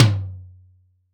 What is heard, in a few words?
tom, acoustic kit